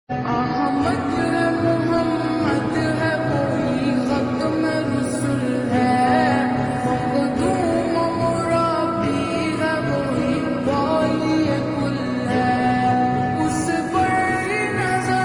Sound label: Slowed Reverb